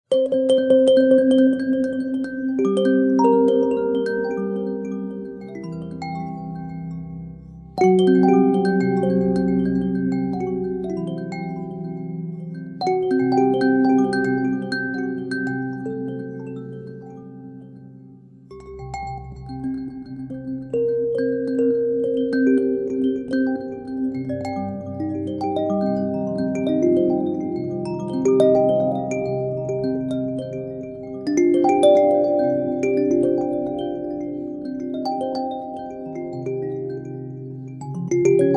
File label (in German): mbira walnuss in höhle